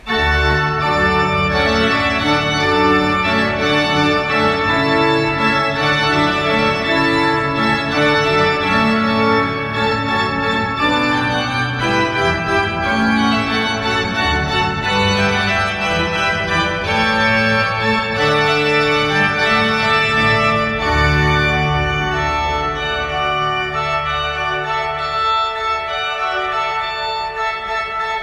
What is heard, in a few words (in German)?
Haendel Wassermusik Hornpipe